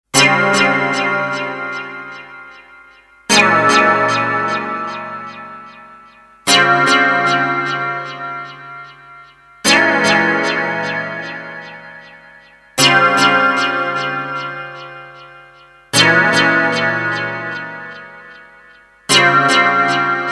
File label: Synth